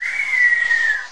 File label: tower; 帝国时代之罗马复兴